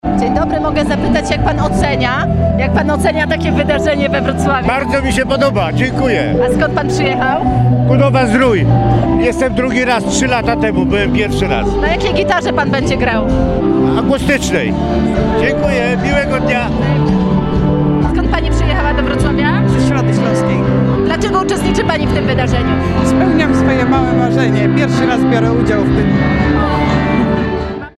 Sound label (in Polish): gitary audience